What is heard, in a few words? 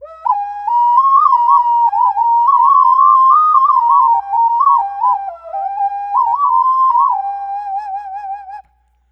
Double Occarina; Ambient; Clean Flutes